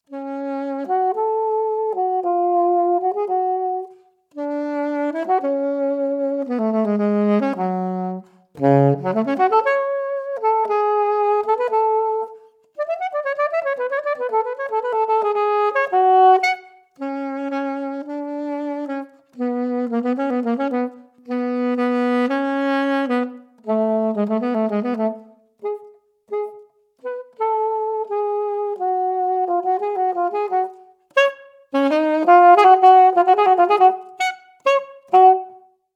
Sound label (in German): Klassik Yamaha YAS62 solo, alt saxophon